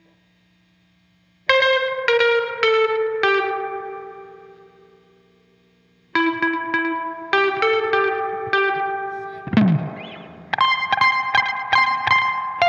guitar overdub; guitar overdub gareth

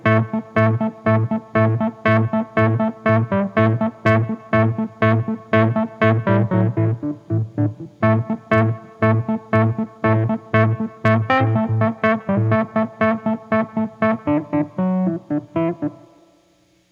guitar

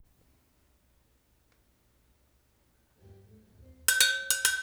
cowbell

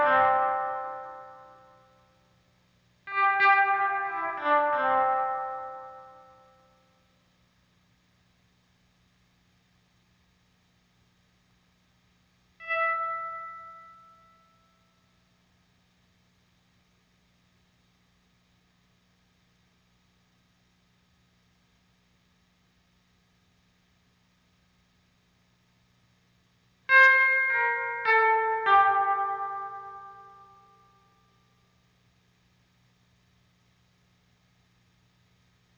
guitar volume swell

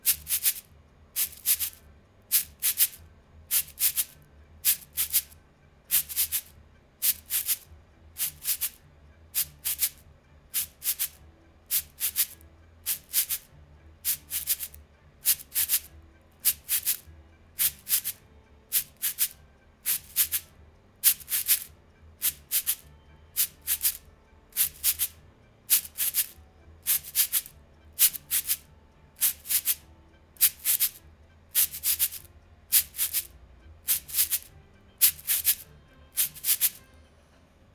twisting shaker thing

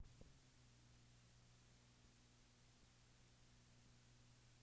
floor tom

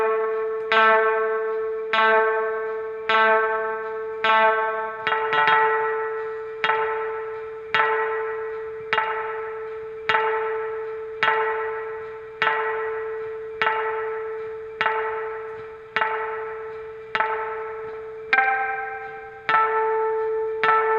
guitar volume swell

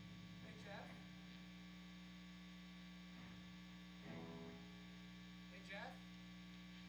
guitar rhythm

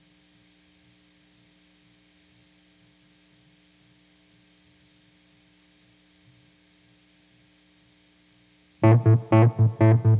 guitar

